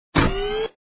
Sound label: gate open